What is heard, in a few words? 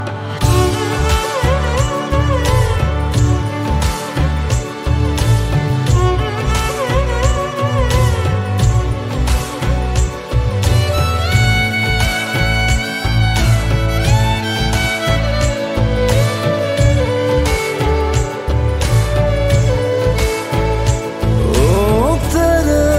hindi ringtone, bollywood